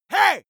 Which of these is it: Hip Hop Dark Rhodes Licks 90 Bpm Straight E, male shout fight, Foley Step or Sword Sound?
male shout fight